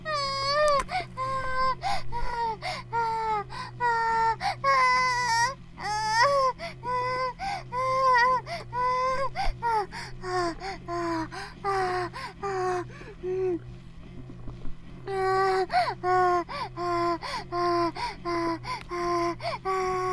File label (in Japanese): バイブ放置; イクたびにわけて